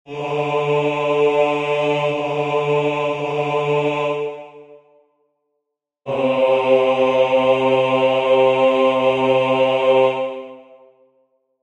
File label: Bass, basic tag, barbershop tags